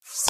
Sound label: Golf slide open; Nokia; audio themes